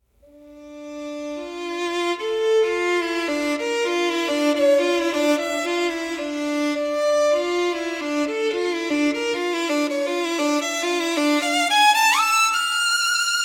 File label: spiritual spooky soundscapes